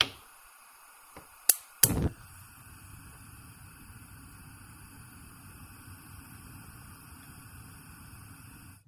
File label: Kitchen gas